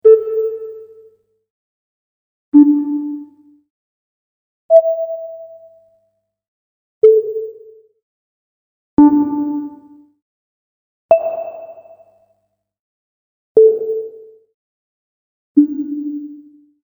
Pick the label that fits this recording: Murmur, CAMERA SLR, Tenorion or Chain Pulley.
Tenorion